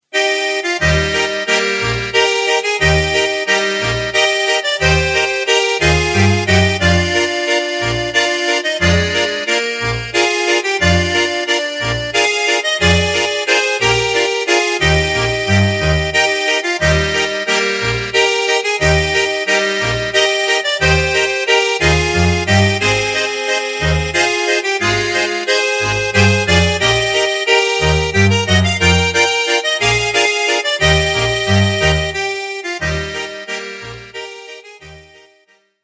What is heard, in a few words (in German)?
Walzer